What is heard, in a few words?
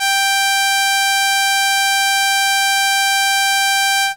Viola G5, ARP Solina Unmodulated; musicradar-synth-samples